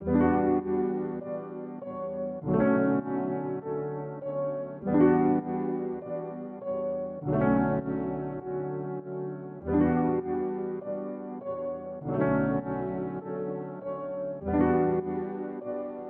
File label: 100 BPM E Min Piano; Loop Stems; Cymatics; Infinity Melody Collection; Betrayals